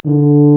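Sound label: brass, TUBA C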